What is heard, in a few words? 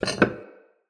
Vase Medium Impact; Vases